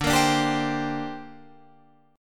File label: D 7th Suspended 4th Sharp 5th down Guitar Drop D